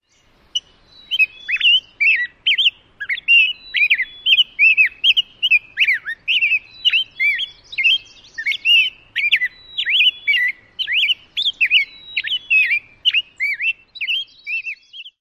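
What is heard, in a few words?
rose-breasted grosbeak extended song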